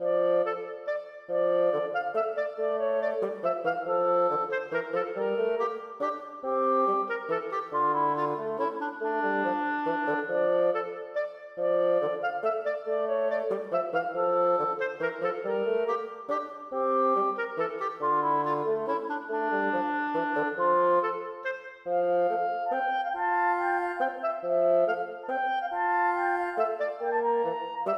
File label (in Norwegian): Menue for klarinett og fagott; Bang